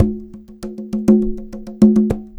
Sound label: Conga Loop (100BPM); musicradar